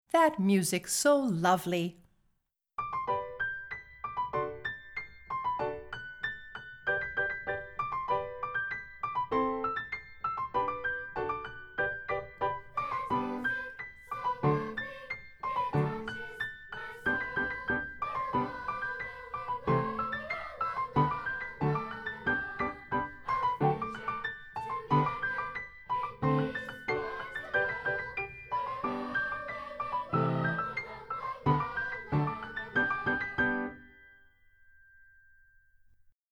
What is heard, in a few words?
That music so lovely piano